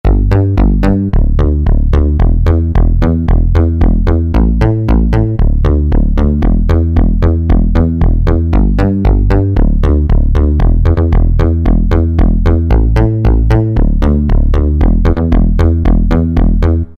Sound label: Octave Synth Bass; Roland PN-D50-04